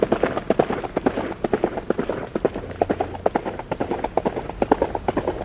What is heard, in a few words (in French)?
Galop